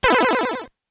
PO 20 ARCADE Cross Laser F; Audiowanderer

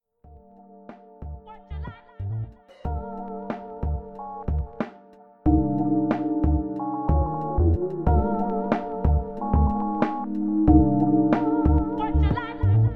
Floppy beat; Audiowanderer; Floppy Sounds Vol.I Sample Pack

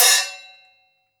Dirty drums Hi hat close; Audiowanderer

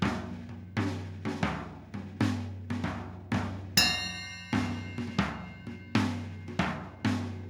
Dirty drums Tom loop; Audiowanderer

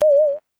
PO 20 ARCADE Alien N; Audiowanderer

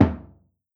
Dirty drums Kick low; Audiowanderer

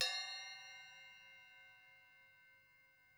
Dirty drums Bell; Audiowanderer

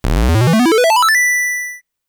PO-20 ARCADE Samples Pack Xtra Life A; Audiowanderer

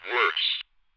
Speak Spell Glitch Worst; Audiowanderer

